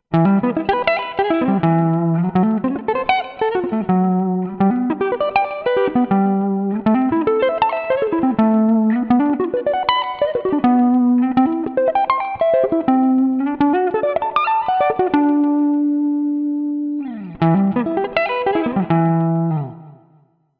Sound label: shred